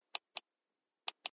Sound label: sms